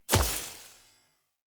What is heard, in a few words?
Idol Projectile Default, Weapon Impacts; Idols